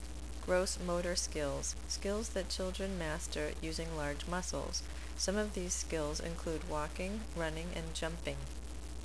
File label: gross; gross motor skills